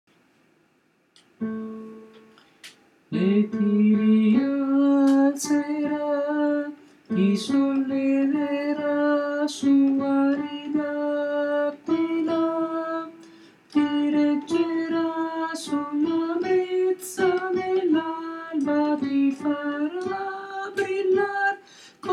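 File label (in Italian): su ali daquila, contralto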